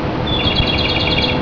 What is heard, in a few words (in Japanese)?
komadori; hayatine